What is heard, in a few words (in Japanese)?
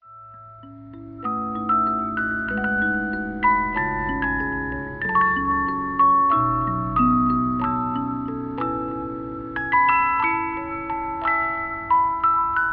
musicboxes